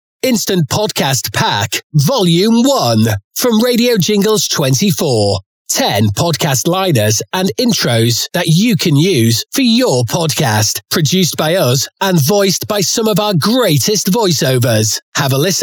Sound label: Jingle; Jingle Pack Demos